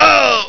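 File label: scream; duke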